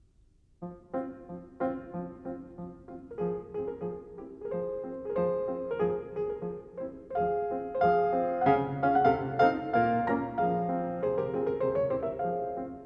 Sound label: Moments musicaux for piano, No. 3 in F minor; Schubert